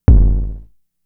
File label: FX, Drum Machines; Fricke Schlagzwerg